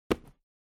Cell Phone Hardware Put Down Cushion

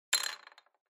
Pencil Put Down

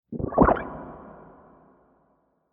Motion Swallow

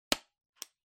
Stapler Staple

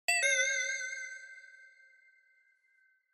Onoma Inspiration High

